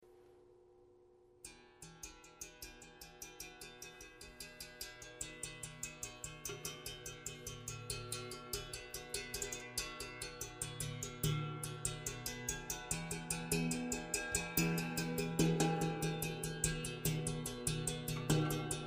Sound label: hammered on strings and inner body